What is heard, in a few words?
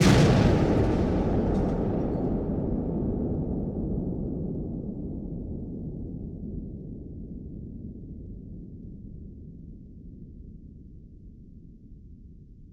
WWII Explosion With Long Trail; Period Combat